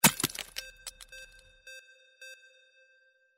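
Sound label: impact ice break; windy beach audio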